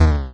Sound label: stop